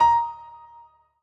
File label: Key piano; luxembourg zwee